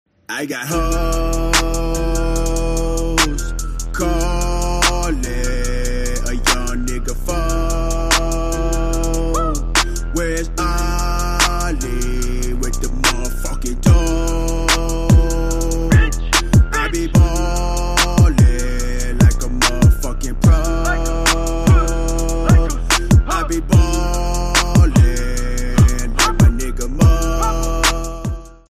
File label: English Ringtone